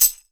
TAMBOURIN, Percussion

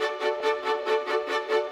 Violins, Orchestral; Sonic Foundry (Sony Creative Software); Orchestral VOL-2; Modern 13

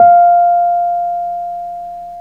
PNO, Wurly; Roland LCDP10 Keys of the 60s and 70s 2; Wurly 200

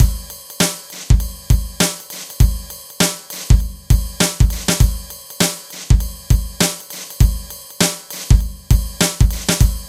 JAZZ, Funky Xtreams; INIS

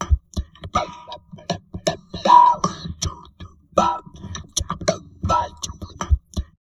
TALK, Drum Loops Crazy Processed

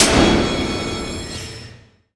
movie, impacts & hits; Techno Trance Essentials

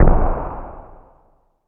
U220 FX Set, Drum Machines; Roland U220

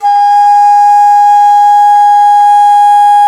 DIZI, Winds

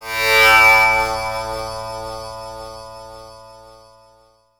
TAMPURA; Spectrasonics; Heart of Asia

